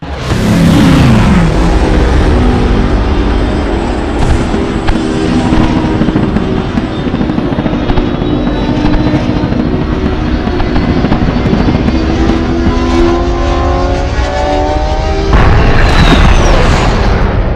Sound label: fly in 3 ships; Beacon; Titanfall 2